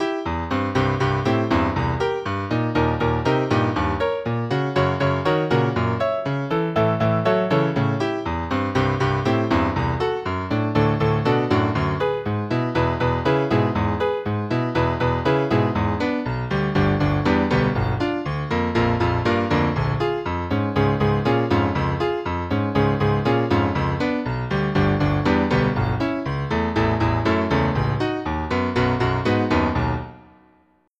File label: Girl Piano